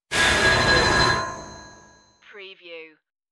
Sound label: starship sliding door